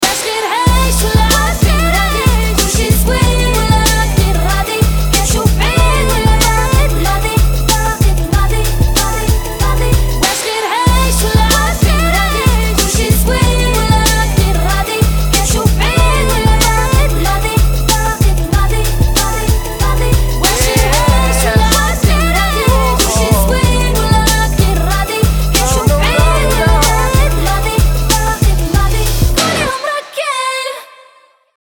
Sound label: ringtone